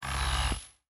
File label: coil, electromagnetic plant; Factorio